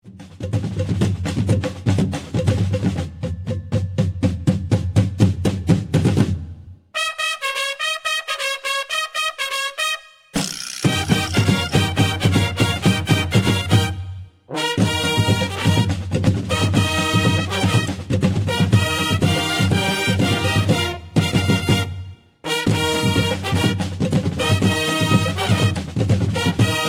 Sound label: Samba